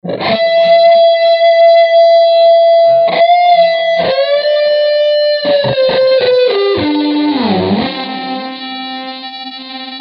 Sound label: break solo